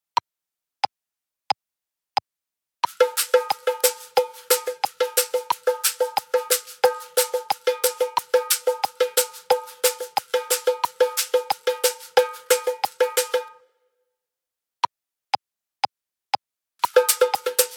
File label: Caxixi